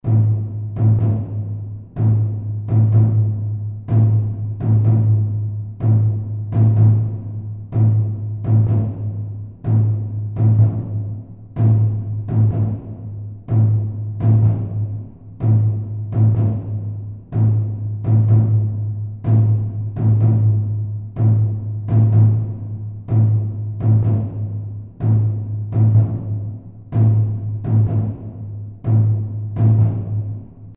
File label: tension Loop; Dynamite Digger Eureka